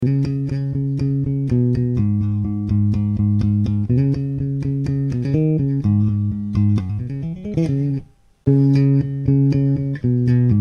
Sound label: Slapper Bass Compressor